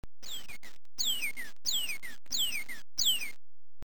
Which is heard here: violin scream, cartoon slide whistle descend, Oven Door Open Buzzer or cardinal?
cardinal